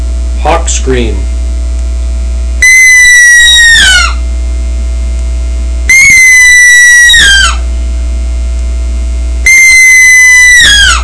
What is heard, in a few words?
hawk scream; Southland Sounds